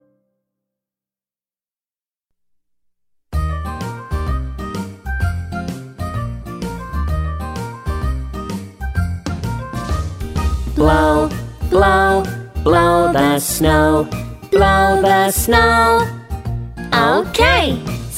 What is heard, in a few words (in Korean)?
영국여 동요샘플